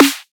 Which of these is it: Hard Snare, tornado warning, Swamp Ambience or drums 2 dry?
Hard Snare